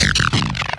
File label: Kaos FX, Ambient Grooves